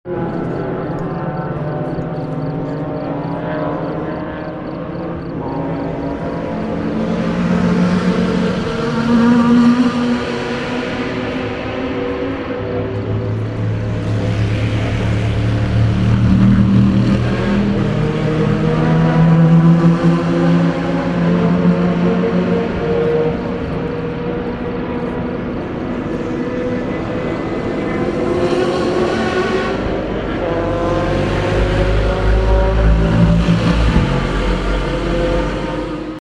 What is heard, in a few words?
night, le mans